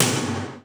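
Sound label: processed TOM, drum; Crypton - DrumBase5000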